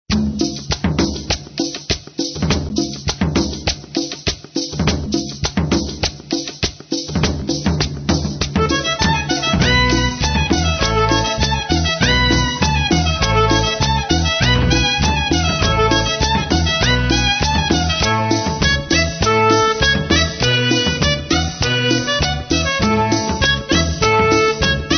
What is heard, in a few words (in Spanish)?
maracas